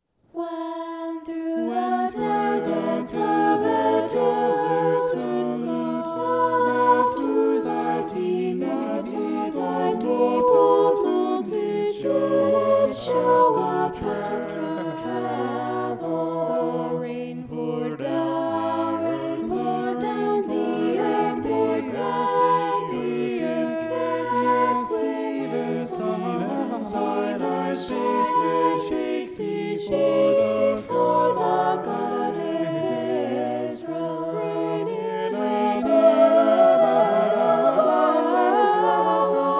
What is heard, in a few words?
vocal poly; psalm 68